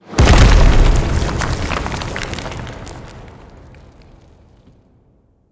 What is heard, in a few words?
Stone Boulder Land A